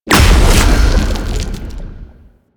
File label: Spawn Large Enemy; Warframe; Man In The Wall; Enemy Gameplay